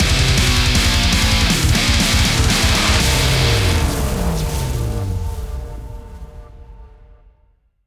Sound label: Boss Killed (Inst); Killing Floor 2